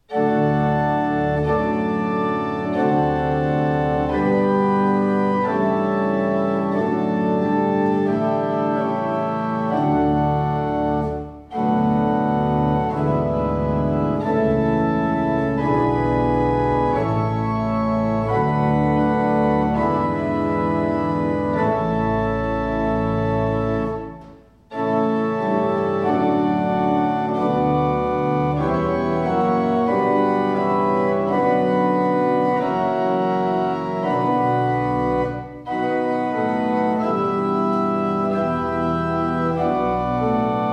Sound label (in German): Andante Sonate D dur, orgelbau; Felix Mendelssohn Bartholdy